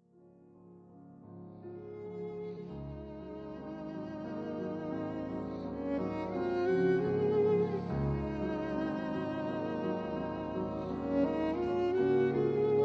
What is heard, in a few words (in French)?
Sonate violon piano